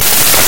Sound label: Laughter Of Pig